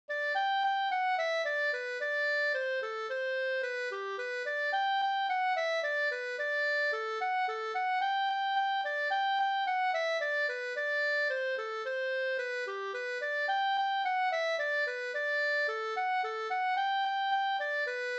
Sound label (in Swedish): polka polkett; fr Loshult Sk